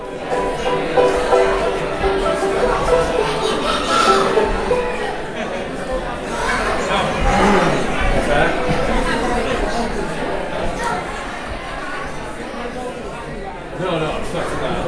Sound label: tuning; Bluegrass; stage; akg461